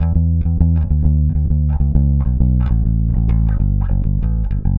Electric Bass; Basses

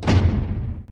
cursor move; theGoonies; Retro

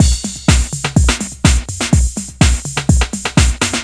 GROVLOOP; SoundFactory; DRUMLOOP

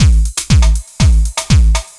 Dance Drumloop; Drums; Dance Beats

